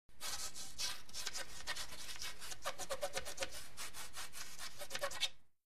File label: Billiards Chalking